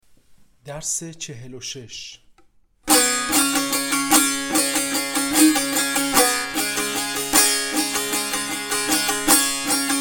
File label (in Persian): مشق تنبور